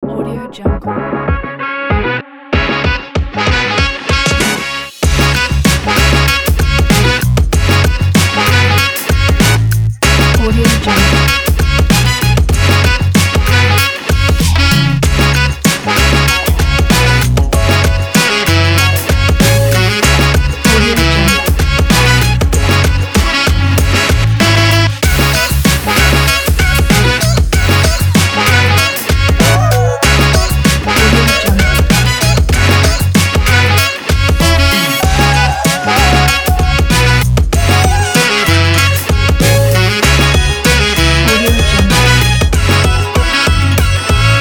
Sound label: hip hop